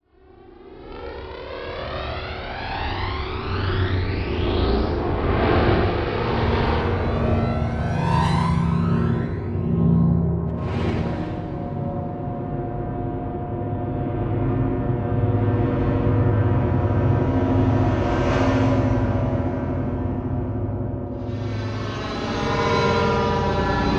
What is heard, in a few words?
Quiet Tension; Module Production Element Title Imaging; Imaging Elements